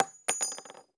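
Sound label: bullet case bounce 50bmg wood hard; gmod; viper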